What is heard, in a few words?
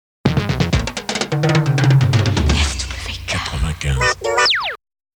jingle